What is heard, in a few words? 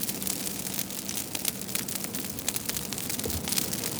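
fire medium; emitters global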